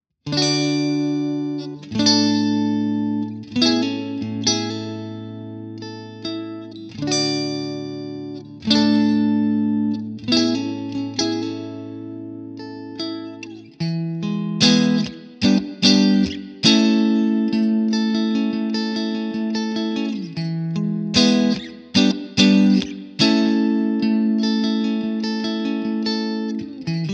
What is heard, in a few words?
clean; jensentone